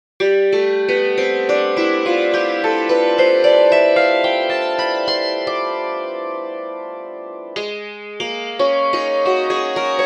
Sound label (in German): hackbrett